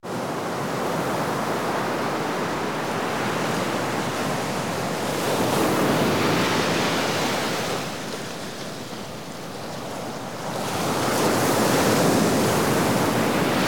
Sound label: medium to heavy waves coming in with spray